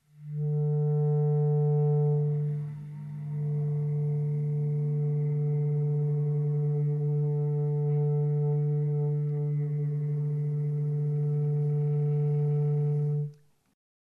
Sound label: Clarinet